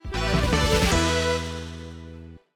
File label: Victory Short; Beholgar